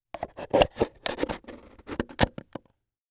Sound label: Foley Wood Box Closing Box